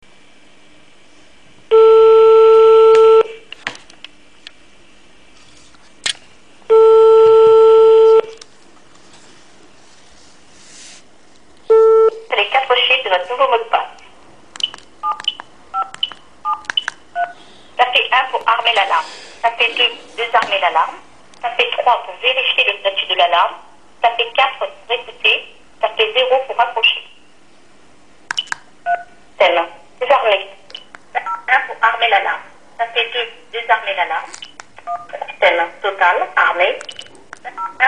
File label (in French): Alarme Connexion a distance